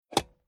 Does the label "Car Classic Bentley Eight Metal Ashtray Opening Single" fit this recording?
Yes